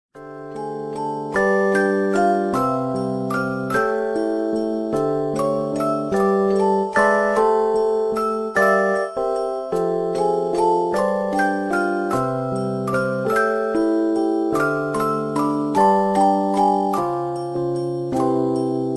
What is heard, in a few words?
soft peano